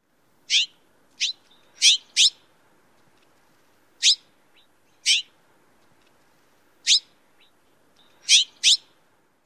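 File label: calls, Mexican jay; AZ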